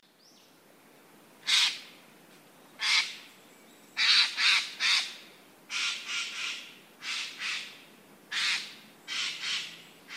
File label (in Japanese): kake